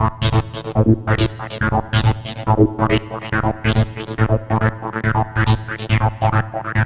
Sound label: 140 House Arp Amin; Pro Tools Essential Loops; Dance DJ; Synth; Arps